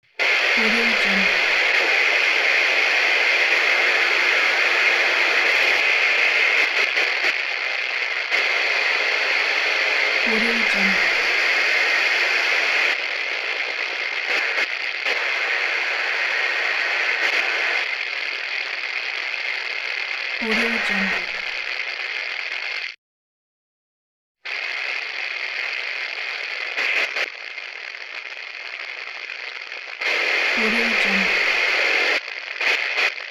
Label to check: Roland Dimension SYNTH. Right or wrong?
Wrong